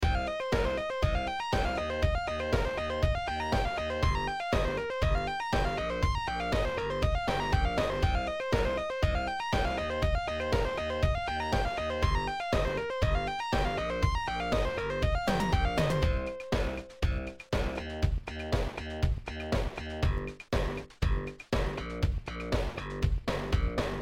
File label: bass basstone lead sound beat